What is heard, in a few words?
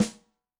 Maple, Yamaha DTXpress-II snare